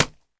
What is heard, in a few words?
Boxing Glove Hit Slap